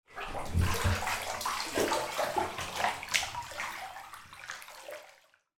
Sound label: household bath person gently lies down relax water